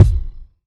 rich lows kick sound g sharp key, kick drum single hit tuned to the G# note